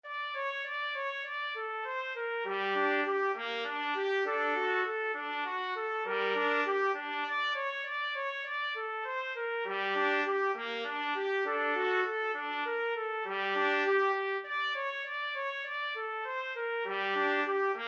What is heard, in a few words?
fur elise trumpet duet